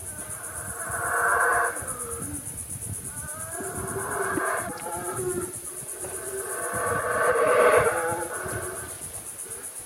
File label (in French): singes hurleurs